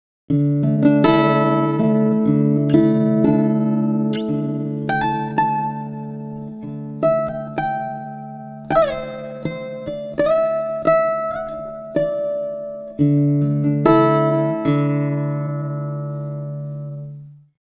guitar; pitchshift

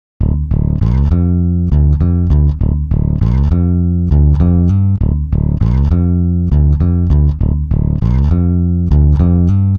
bass, pitchshift; izotope radius

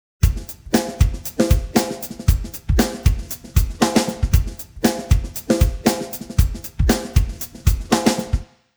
drums; serato pitch n time le; timestretch

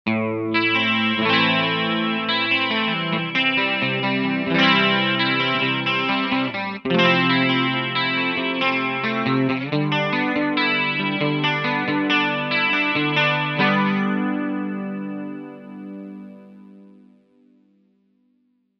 Space chorus chorus strat pod; line6; tonecore; Uber-Metal

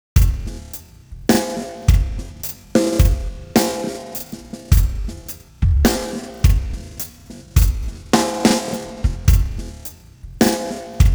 drums; digidesign pro tools ts; timestretch

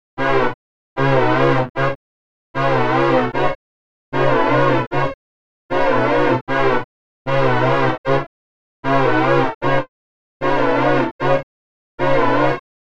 eminent vibrato, Virtual String Machine; gforce; vsm

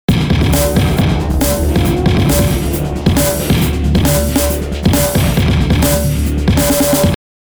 drumloop; sugar bytes; effectrix

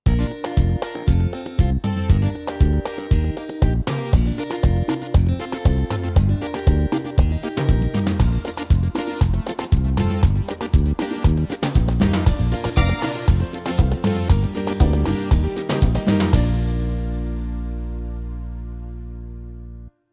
style world zouk, yamaha tyros3

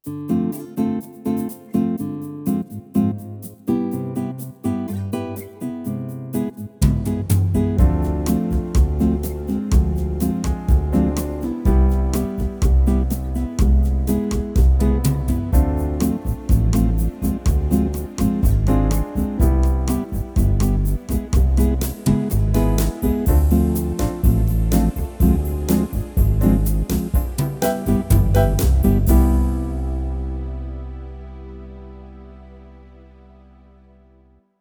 style latin bossanova, yamaha tyros3

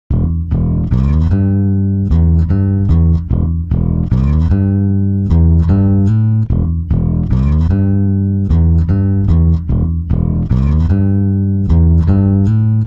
bass; timestretch

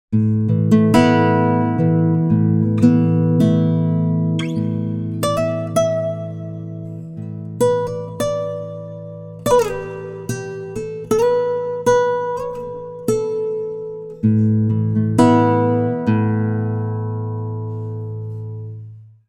guitar, timestretch

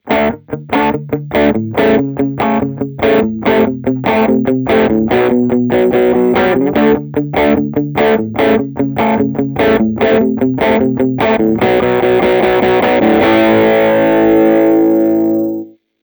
crunch bridge; guitare; vintage electric

